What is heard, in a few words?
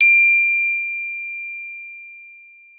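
glockenspiel D5; Xsample VOL-4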